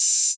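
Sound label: 808 open hi hat; Goldbaby Tape808 & Tape909; The Tape 808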